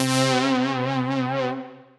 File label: Funk Lead, Synth Lead; SH02; Ableton; Chop and Swing